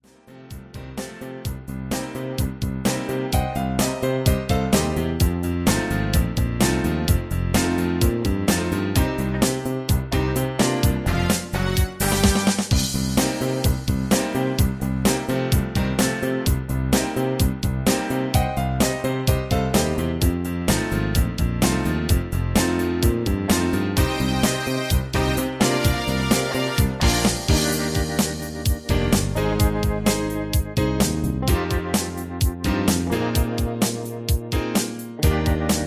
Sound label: Instrumental with No Leads